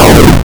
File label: Boom; Catch Game; Unity 2D